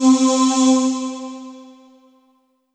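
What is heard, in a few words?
Yamaha TG500 Choir Ooh C4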